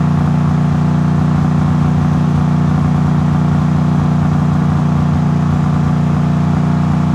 68 Road Runner Loop, Automobiles

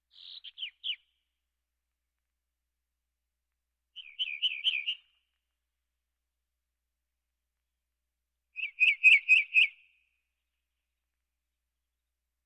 Birds

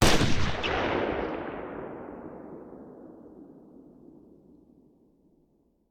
Machine Gun; Sound Ideas; Guns

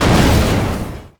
Explo Small; Destruction

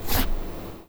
slide

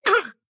town human road hit; Blind Drive

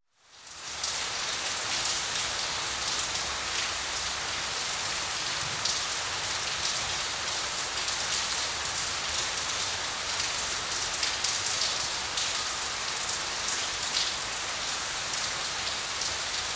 Rain in the Street; The Elements

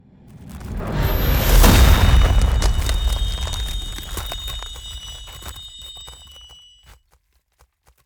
Ice Spell Damage AoE Frost Ring; Arctic Aura

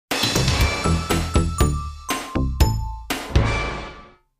Jingles; 90 DDD Jingles

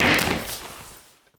Bodyfall Tile; SFX Kit; Bodyfalls

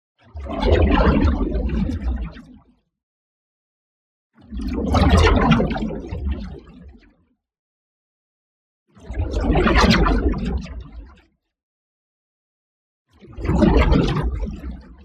WHOOSH MEDIUM Plasma Ball; boom library; Matter

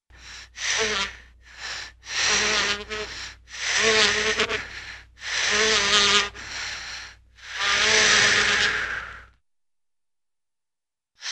Funny Fly Breaths, Cartoon; Hollywood Edge; Cartoon Trax

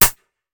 Musket Foley; Sound Ideas; Guns

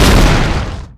Explo Rock; Destruction

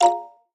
chat; firefight

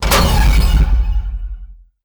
Pickup Scifi Energy; SFX Kit

